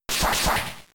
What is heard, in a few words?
Metal Claw